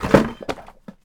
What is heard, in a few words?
Book Drop Trash Can; SFX Kit; Foley - Misc